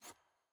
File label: sfx ui generic section microfiche hover